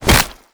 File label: headbutt, zombie impacts; creatures